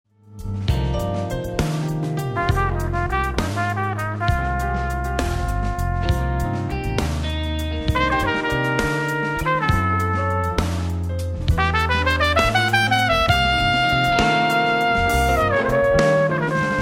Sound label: Jazz